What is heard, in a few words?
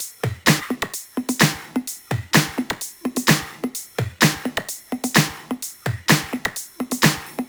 128BPM Kit; Vengeance Samples; VFH1 128BPM Basique Ale Kit